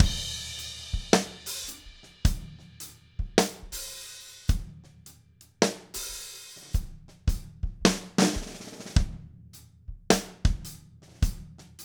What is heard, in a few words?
107 SLOW GRV, Drumscores; Steve Gadd Drumscores; LO BPM